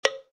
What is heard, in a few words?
wood frog bloc sound effect